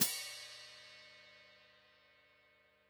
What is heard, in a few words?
close Pd Hat, drum